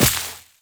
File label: Step Veg, Footsteps Walk; Warframe; Characters; Tenno